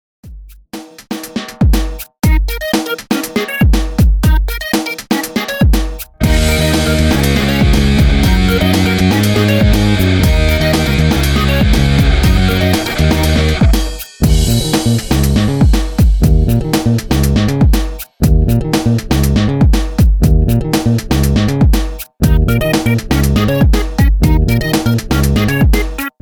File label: Big Beat 120bpm remix